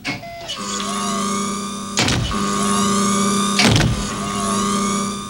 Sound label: HYDRAUL; Northstar; The Wizard VOL-1